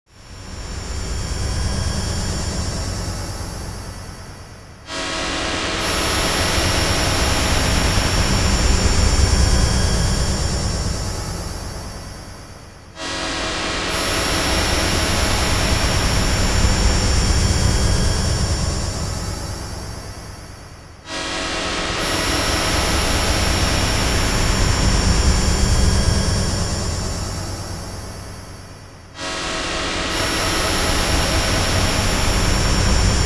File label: Noise Waves; Bizarre Planet